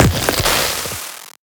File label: explosion; BlueZone - District 10 Mothership Sound Effects